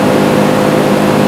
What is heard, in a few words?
compressor; Louisiana